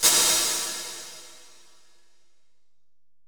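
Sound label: 909 closed hi hat; 480L; Wizoo; Platinum 24 Electronic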